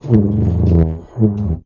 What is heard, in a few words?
odd flange, tuba